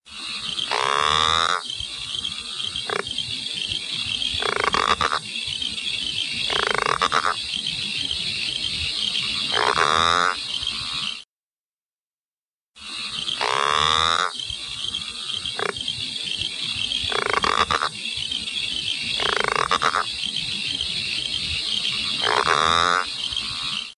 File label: Growling Grass Frog; landcare